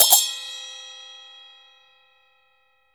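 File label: CHINA SM2 L, China cymbal; Spectrasonics - Heart of Asia CD2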